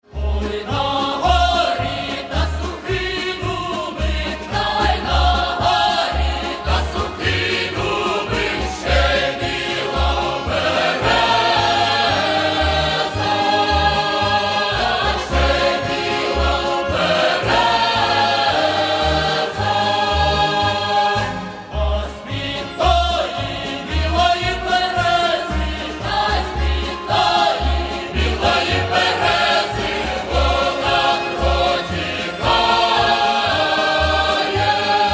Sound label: Folk Choir; Cherkasy